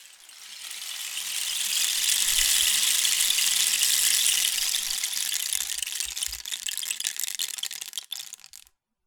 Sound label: Rain Stick, Percussion Samples